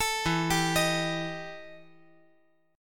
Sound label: E Minor Major 11th E